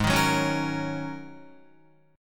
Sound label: Ab 7th Suspended 2nd Suspended 4th down Guitar; Ab7sus2sus4; strummed; 4F,4A,5C,5G,5C,6E; chordshape; JGuitar